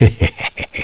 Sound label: laugh